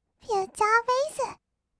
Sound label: future bass; 音楽ジャンル; 1200 kawaii voice!!